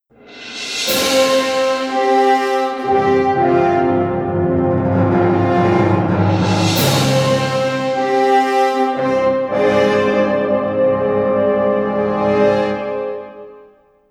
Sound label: Cymbal; Artemis 2.7; Enemy In Range